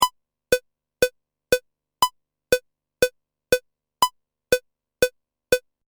Metronome Click, Electronic metronome clicks at 120 BPM; Multimedia